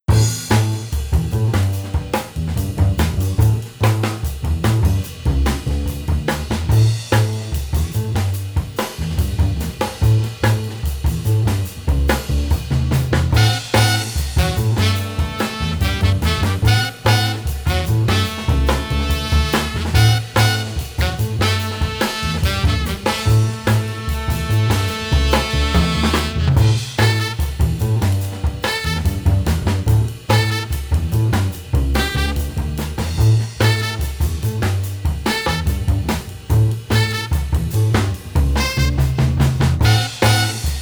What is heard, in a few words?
Street Bass Horns Get Moving 145